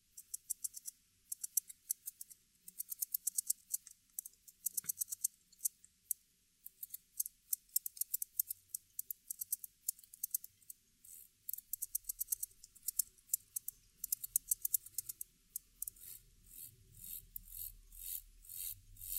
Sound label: mottled grasshopper